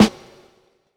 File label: Snare